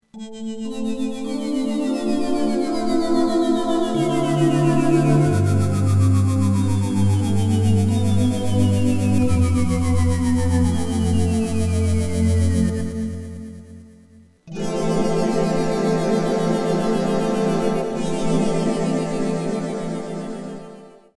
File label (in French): nappe